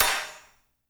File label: HAT SNR1H L; Spectrasonics; Burning Grooves CD2; KLANKY KIT